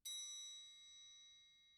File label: triangle hit p; Idiophones; Struck Idiophones; Triangles